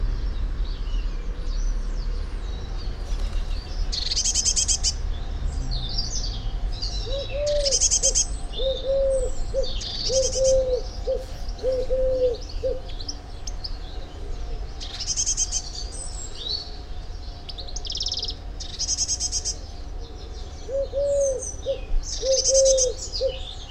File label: Cyanistes caeruleus; SETE